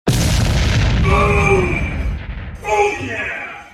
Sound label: boom